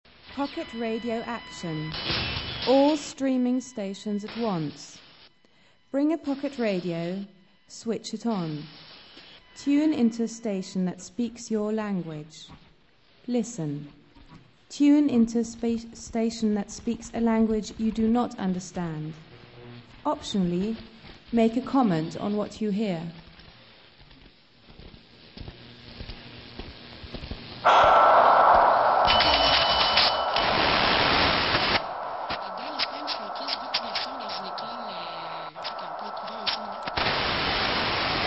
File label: pocket radio